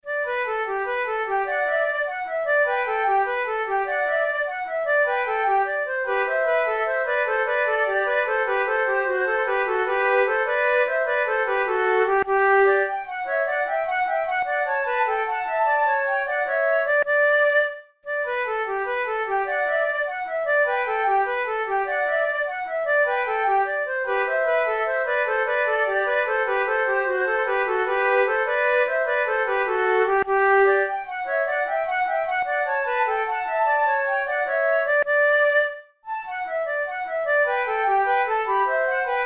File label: vivace, flute tunes; loeillet de gant op5 no2 v